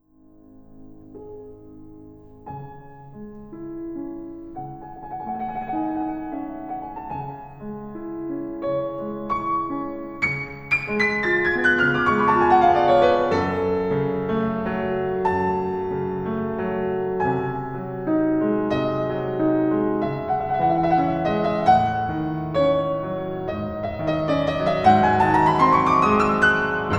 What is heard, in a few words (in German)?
klavier